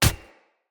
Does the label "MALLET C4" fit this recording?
No